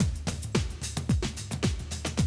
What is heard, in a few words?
Classic DrumLoops, 110